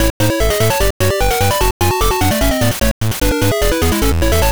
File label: Level Start; Wario Blast featuring Bomberman